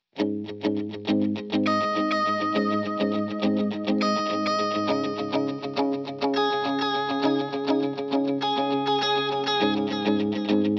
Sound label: Korg A3 Delay